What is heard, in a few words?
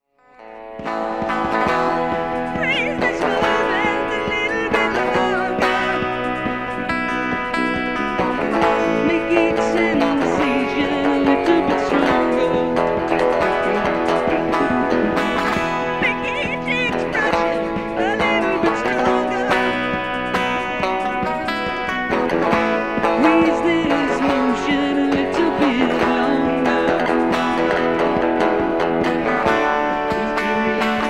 acoustic